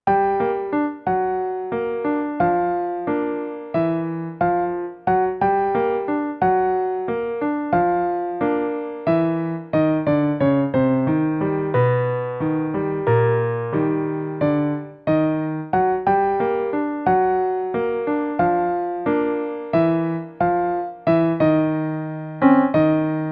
latino lent; MOBICLIC 57

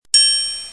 SUCCES; MOBICLIC 122

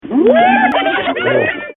hyene; MOBICLIC 64

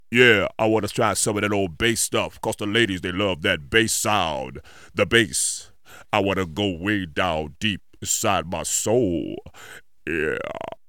Choeurs & effets; L'Effet Pommier - Volume 04

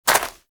pas foret

